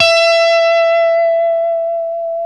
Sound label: Les Paul, guitar; Spectrasonics; Hans Zimmer Guitars VOL-1